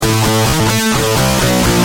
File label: G Pump, Guitar; Industrial